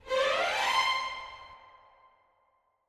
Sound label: gliss